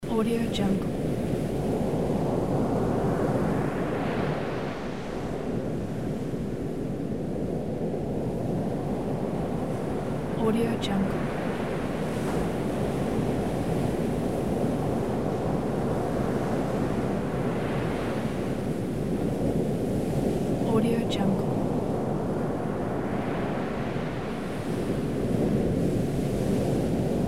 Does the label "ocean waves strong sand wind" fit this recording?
Yes